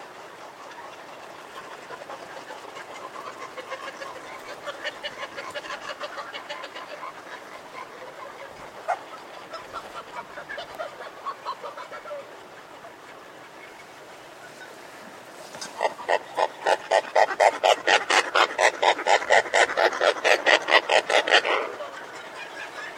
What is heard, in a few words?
antarctic petrels birds breeding